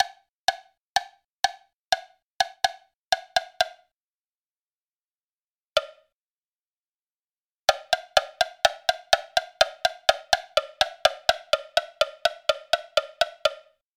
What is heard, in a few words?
woodblock